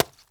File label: Zombie Hits Player; Foley; Zombies; Doom